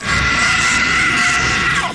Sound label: hu scream; hunter2